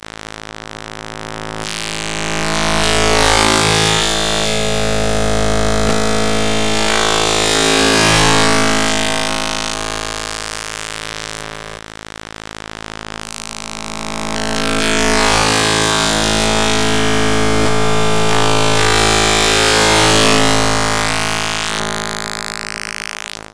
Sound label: Digital Electricity Hazard